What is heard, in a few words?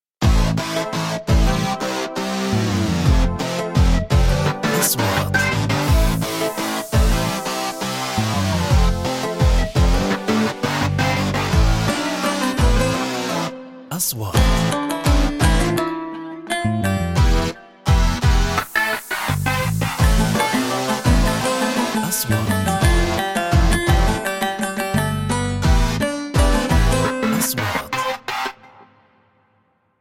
advertisements